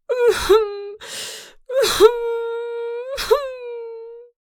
Adult female crying and sobbing

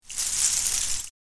Beaded door curtain sway rattle movement; blinds

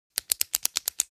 Pen office clicking multiple times

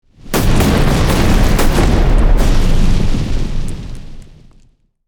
Aerial missile strikes bombardment explosions

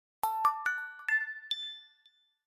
Cell phone notification

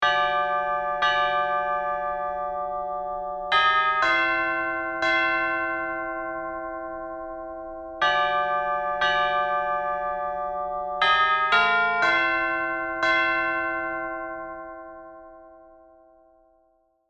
Church bells ringing chimes funeral; large bell

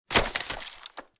Hamper set down soft impact; basket

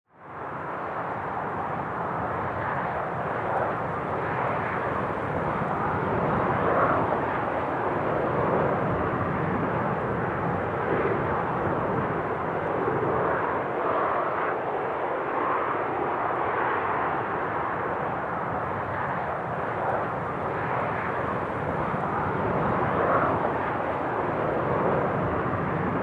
City nightscape on a quiet street

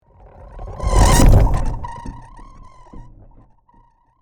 Industrial equipment metallic squeak

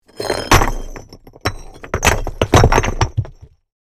Medium bricks thrown on stone and asphalt

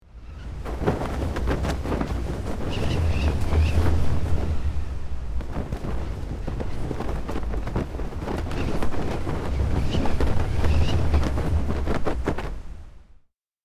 Ship flag flapping flag pole strong wind

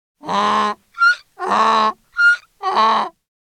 Donkey braying close perspective

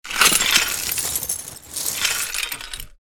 Broken glass and debris rummaging

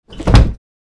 Wooden blind frame heavy close; blinds

